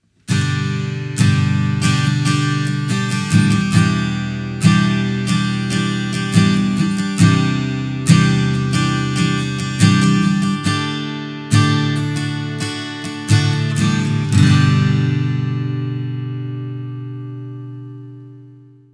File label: STRUM